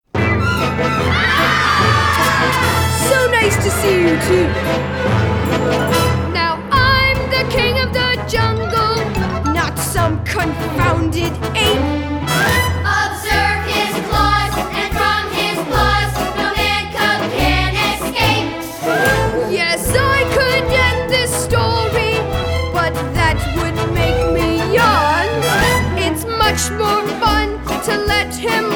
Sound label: vocals; shere khan the tiger